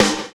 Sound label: DANCE SET, DRUMS